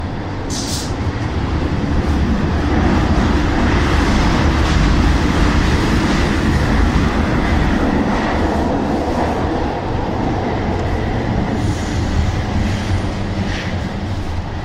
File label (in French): virage, Metro